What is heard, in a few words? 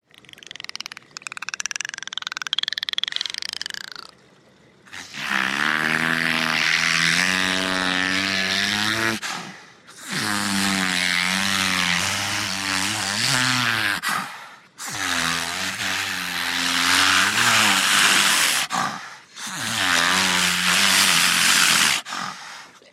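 beluga; beluha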